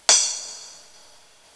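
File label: Cymbal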